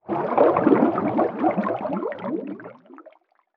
Underwater Water Splash Gurgle Bubbles Air Valve Movement SCUBA